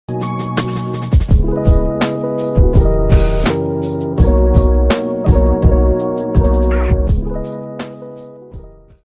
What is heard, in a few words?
10 second chill music